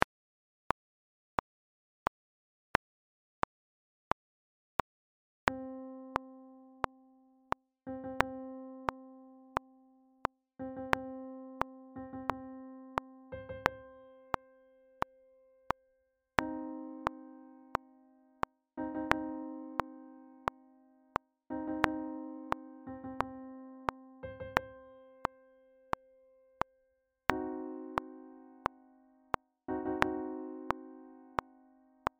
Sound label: tuba mirum backing track